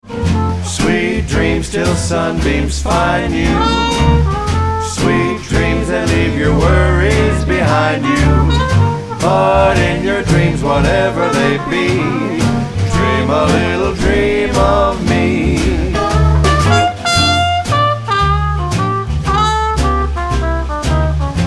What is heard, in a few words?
swing tones